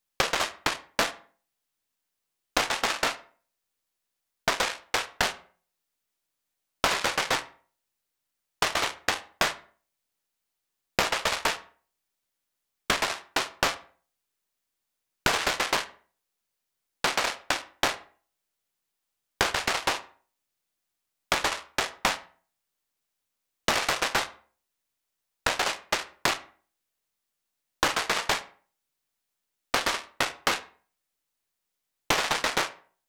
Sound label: 114 Fife N drum Snare